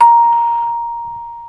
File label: music box